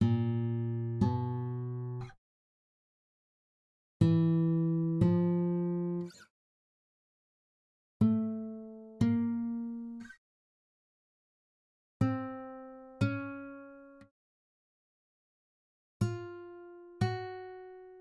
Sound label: minor seconds exercise, guitar